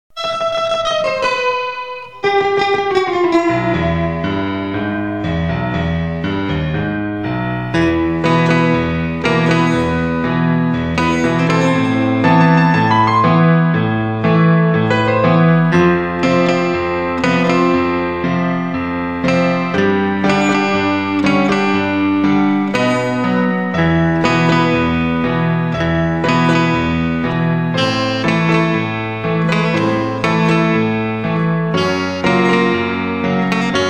piano e guitarra; dexter

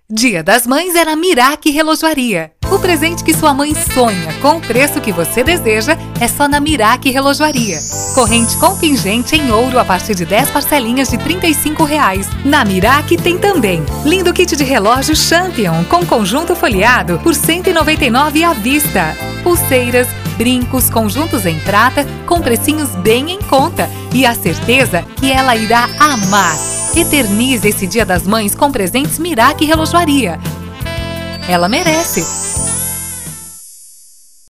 vinheta bonus porta de loja ou interna; radioambiente; mirac

